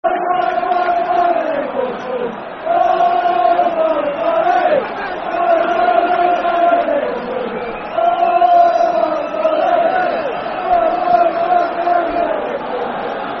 Football Chant (Soccer Song); Barry Nicholson; Aberdeen Afc

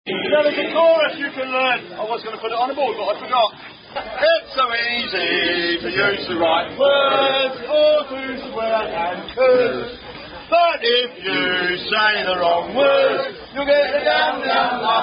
Unknown Hash Drinking Song

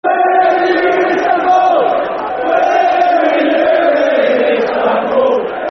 Football Chants (Soccer Songs), Liverpool; Where Were You In Istanbul; Liverpool Lfc

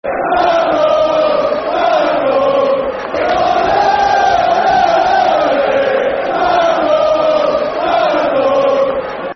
Ole, Ole, Ole, Ole, Football Chants; fanchants; Ipswich Town Itfc

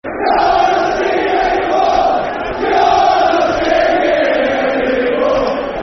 Football Chants (Soccer Songs); You're Not Singing Anymore; Everton Efc

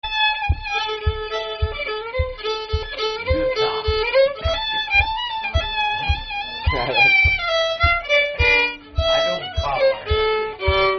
Tune In C & A Minor; Nameless Tune

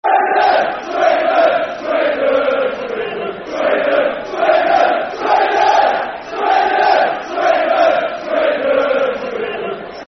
Swindon, Swindon, Swindon Football Chant; Swindon Town Stfc

